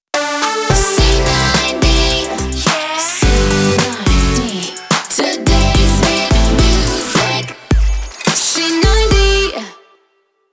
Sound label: Jingle 107bpm e Main ID